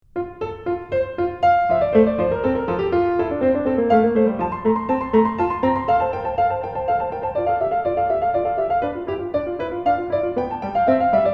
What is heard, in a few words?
Invention Piano; Bach